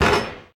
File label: Street Ball Glass Rim; SFX Kit; Street Basketball